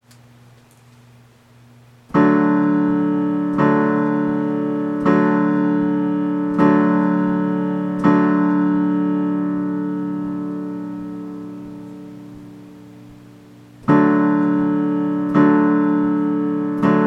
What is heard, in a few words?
chord old folks at home